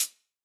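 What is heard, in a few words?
hi-hat, closed hats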